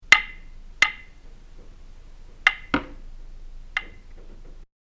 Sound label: Woodsman With Axe, Wood; Wood Sound Effects; fantasy